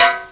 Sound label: Cartoon Hit